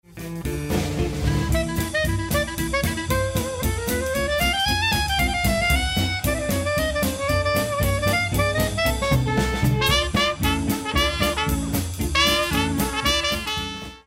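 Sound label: clarinet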